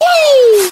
"woo"

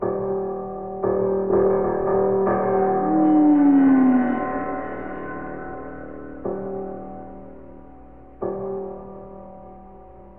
piano, Loops Sad

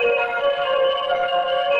roars; Loops; Electronic

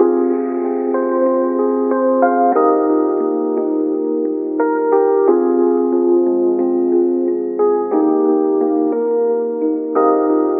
keys, Loops, Sad

